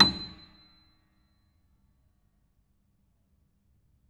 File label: Note High, Piano; Piano Miscellaneous